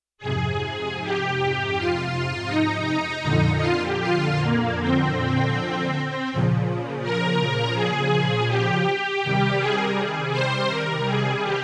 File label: Chorus Filter String; Yamaha TX16W; Orchestral Performance 1